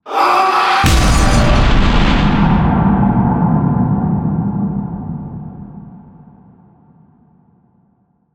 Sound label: Tension Builder Impact